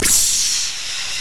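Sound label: VOX WATER CYMBAL; Northstar - New Gold VOL-2; RAP CITY 1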